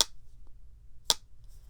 click

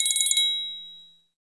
Bell Roll; FREE SFX PACK VOL 2

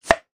plop

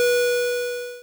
square ring; Square Waveform sfx

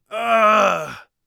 poisoned, warrior voice; Warrior Voice Pack

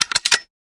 Gun Lock; FREE SFX PACK VOL 1

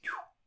laser; weapons

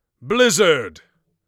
blizzard, Mage Voice Pack

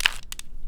Small Cube - Twist, Rubik's Cube

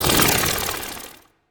synth misc, retro synth; retro synth SFX

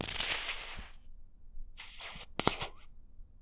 Plop Viscous, Bottle Plops

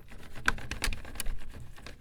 Rattling Cheap Wood; BB Retail Therapy Sample Pack

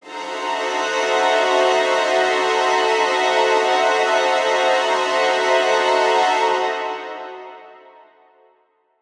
Suspense Strings High, horror strings

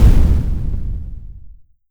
explosion; NIIIEMAND's explosion sfx

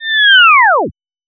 Retro Descending Short

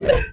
Swipe; MMRetroArcadeSoundsPack

